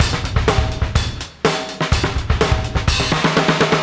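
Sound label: 125 bpm, beat loops; Zero-G; Monster Beats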